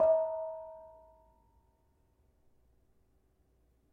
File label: Bonang Barung, gamelan; gravityharps; timbrebox